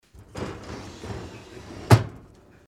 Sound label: sliding door